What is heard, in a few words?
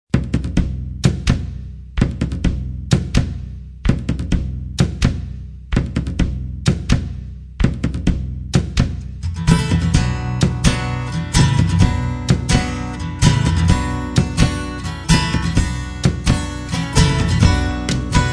Acoustic Track